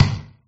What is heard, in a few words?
kick shoot